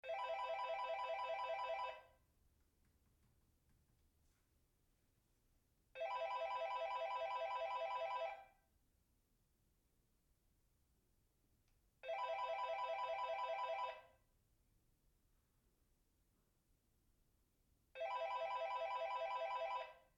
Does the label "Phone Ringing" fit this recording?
Yes